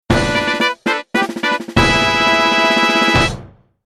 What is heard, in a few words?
Success Fanfare; Zelda